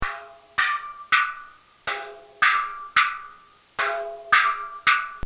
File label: Asian Tympani; Drums (Sound Effects:Musical)